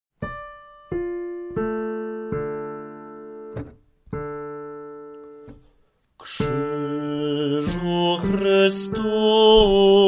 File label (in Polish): BAS; Krzyzu Chrystusa